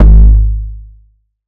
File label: 808 hard Ab; Hip-Hop; Tonal; Slate; CAICOS Hip-Hop Sample Pack